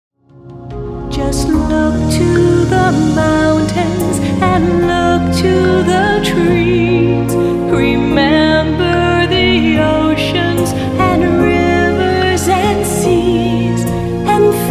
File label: Baby